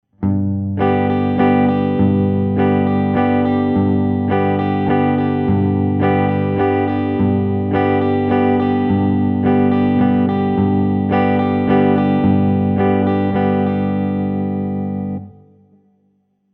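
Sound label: Basic Right Hand Strum Pattern, guitar; introductory guitar skills worksheets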